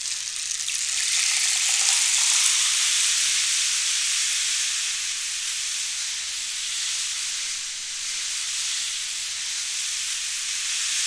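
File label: percussion rain stick; Roland L-CD701; Water Perc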